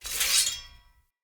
Short Sword Equip; Enemy; Weapons; Short Sword Elite

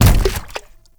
Gas Tank Impact; Gameplay; Warframe; Nineteeen Ninety Nine; PvPvE

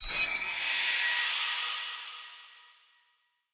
Great Sword Recall Button Push, Weapons; Player; Swords; Mendicant Great Sword

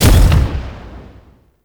Army Proj Explosion; Warframe; Warframes; Hoplite

